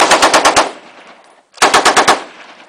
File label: PKM 7.62mm